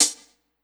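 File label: closed hi hat, 135 BPM; Ueberschall; Drum & Bass Resonance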